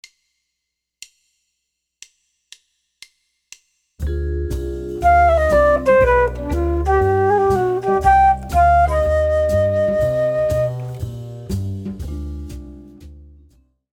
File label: c minor 2 5 1